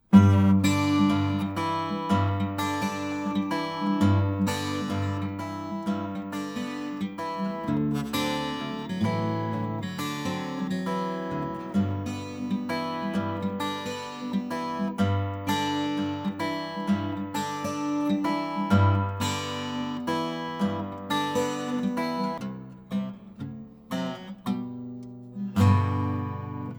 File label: 12 str, guitars